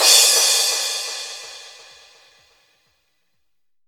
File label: Crash; Vengeance Samples; VEE Cymbals